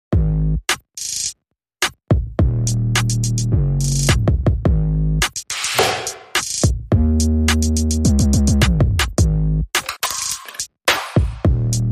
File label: Drums and Bass